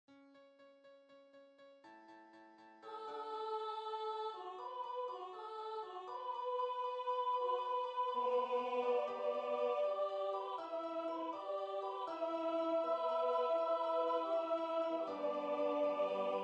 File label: A fairy boat Tenor; Magic Knight